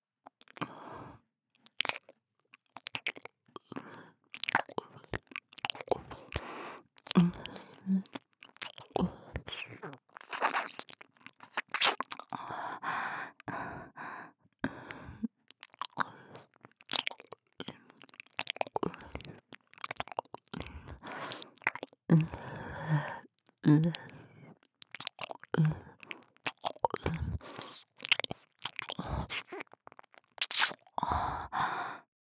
舔耳(左); 低音ボイス奴隷執事。ZWEI

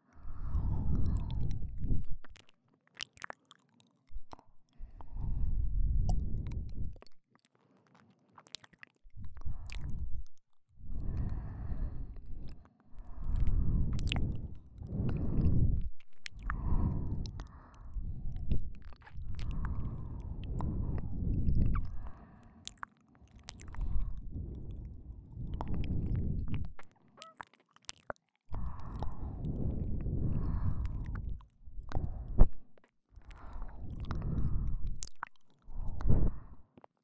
耳朵亲吻

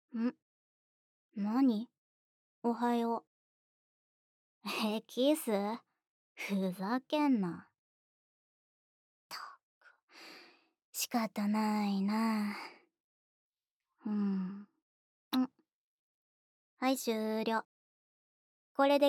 早安的親親; ヒネくれて育った妹に友達マウントでデートに誘われていいようにマウント取られてしまったある日のデート日誌

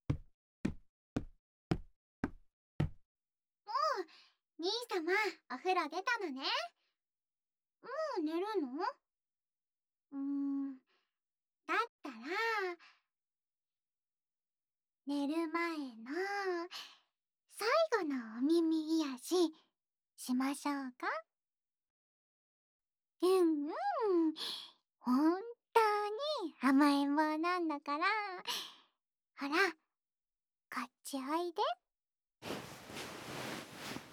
用保鲜膜治愈耳朵？; 炭酸耳かき・ネイルタッピング; SisterDays―妹ちゃんはお兄様と心ゆくまでだらけたい―; CV.村上奈津実

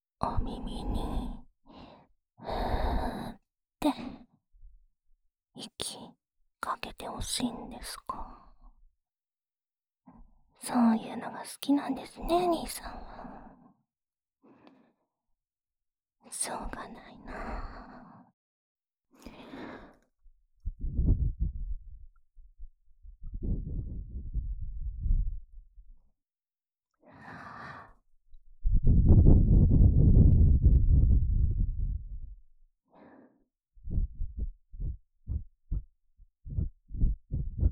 吹气／左, ASMR; 被有如妹妹一样的青梅竹马宠爱调教的日子

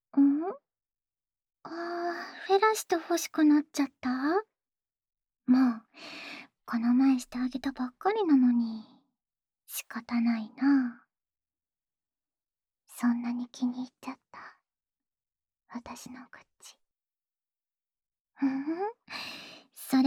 口交; 自慰輔助用; 青春べろちゅー委員会♪～唾液も舌も絡めまくり!最高のキステクで口内恋愛♪

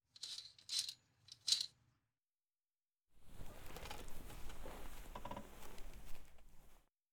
文學少女的掏耳朵（左耳篇）; 桜木学園癒やし部～2年A組・佐々木絵空。文学少女の耳奥癒やし～; 耳かき・マッサージ

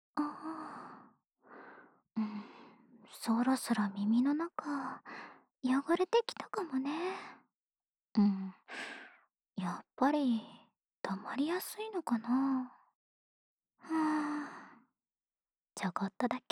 安眠用耳朵清潔（右側）; 猫になるASMR; 吾輩の耳は敏感である!～ツンデレ子猫生活で手洗いシャンプーや幸せへと誘う優しい耳かきを体験～

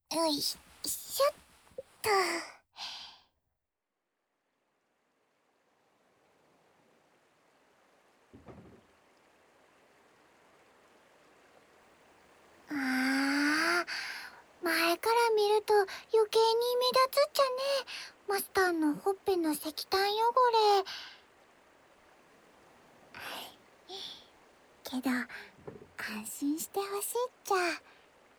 臉部卸妝處理＆臉部提拉按摩; 清理乾淨用餐弄髒的臉; 蓄音レヱル ノワール; CV.山根綺

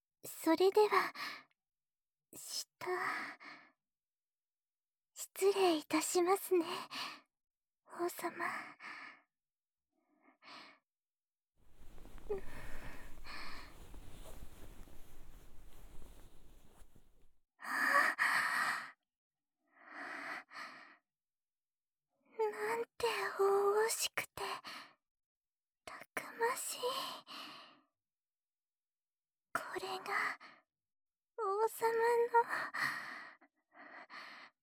舔耳飞机杯手淫; Healing of King～温柔僧侣的下流按摩～

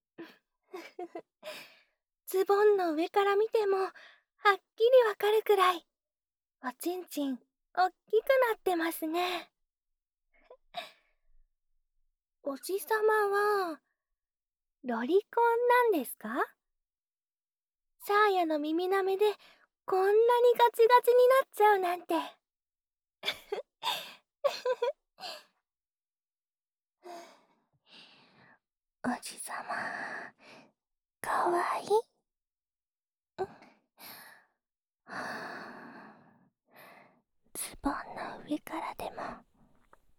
治愈舔耳（左耳）; 大小姐ＪＳ; 大小姐J○沙耶 VS 教你做人大叔

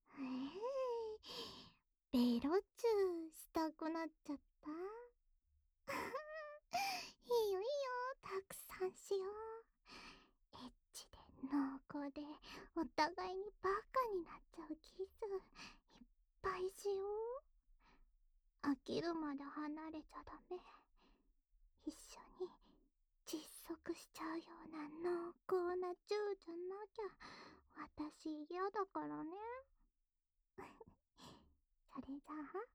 舌吻, ASMR; 戀愛用; 莉莉亞

